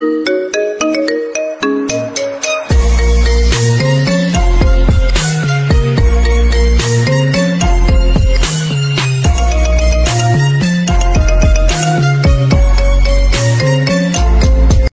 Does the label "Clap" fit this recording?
No